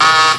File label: buzz